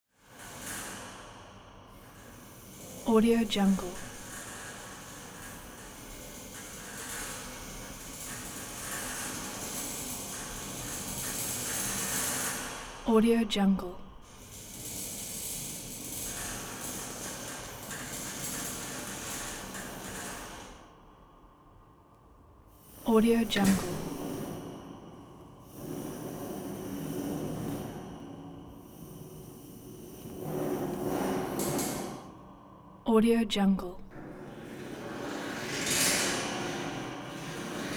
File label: baking rack rattle; prosoundfx